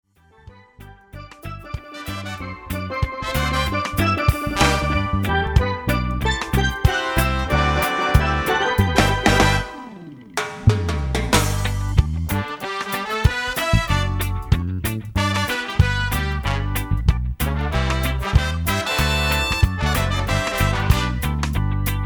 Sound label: horn soli